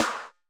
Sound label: Hi Pop Sht, Alesis SR16